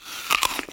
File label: Chomp; SFX; 7rystan's 600+ FREE EDM SAMPLES & DRUMS